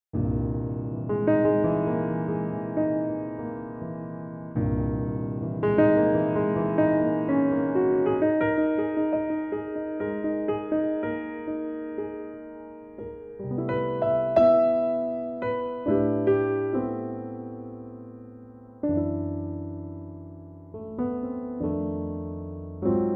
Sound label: C piano; C Pianotrak; key - C - vocal range - G to E